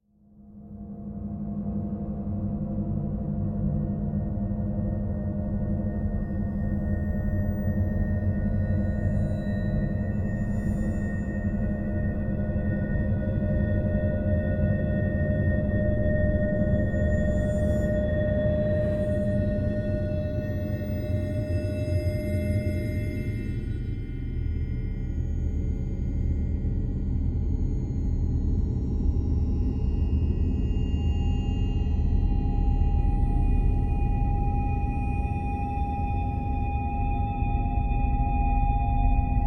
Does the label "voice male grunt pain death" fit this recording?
No